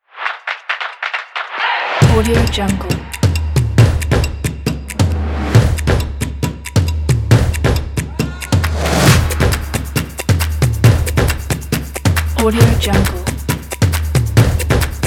stomp

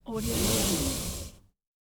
car seatbelt unwind

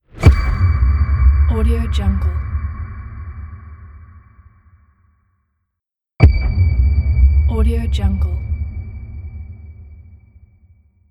dark space mysterious hit

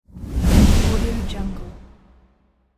fire whoosh

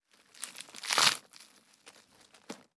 crunchy tear or rip

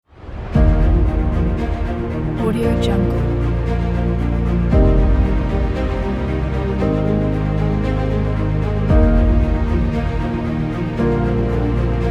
violins for adventures

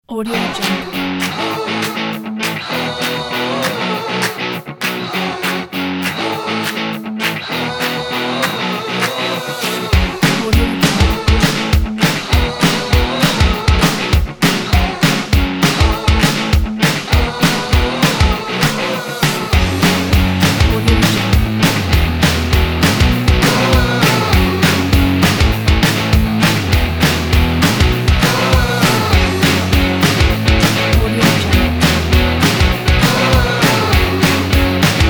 rock indie upbeat energy

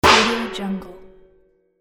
metal clang

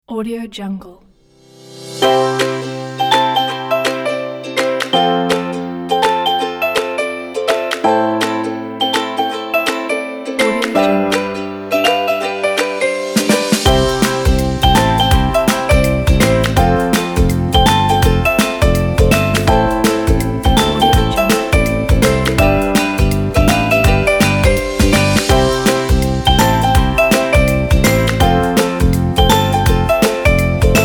upbeat bright happy ukulele advertising

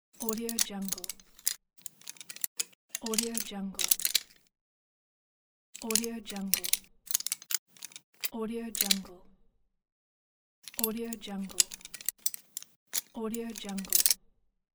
lock picking

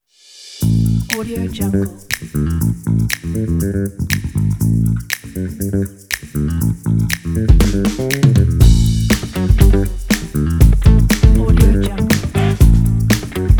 simple funk background

